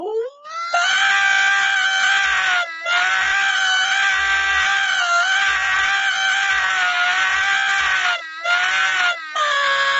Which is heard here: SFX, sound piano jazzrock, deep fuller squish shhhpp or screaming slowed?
screaming slowed